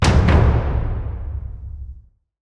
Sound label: mood intro tense; spirit blossom